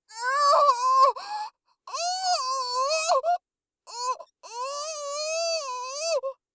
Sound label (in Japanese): 口枷; 効果音なし